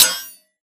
bullet light ballistic blades deflection; Titanfall 2

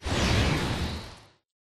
atlas dash slide; Titanfall 2

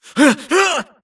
grunt Struggle; Titanfall 2

